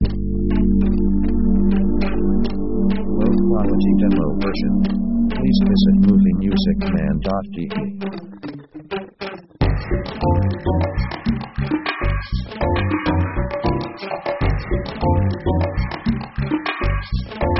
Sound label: pop 100bpm funky machine; synth themes